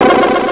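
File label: battery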